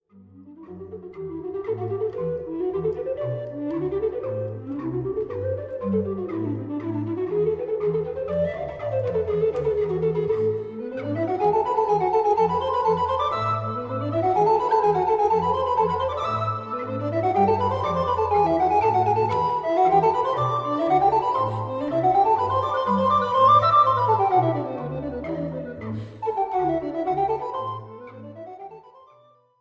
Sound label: Bassadanza; Francesco da Milano; La Spagna